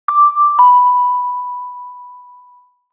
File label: Flight announcement tone airport departure double